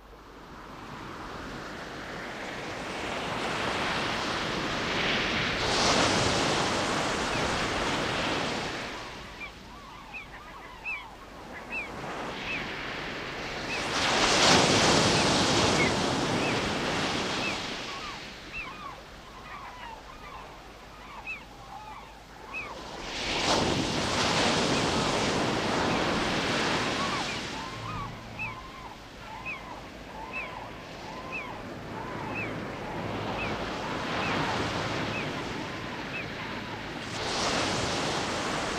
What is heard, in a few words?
Amb Shore; Ladianes